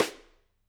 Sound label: K2 room SdSt, drum